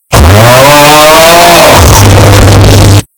augh amplified; omega

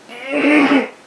pain frust

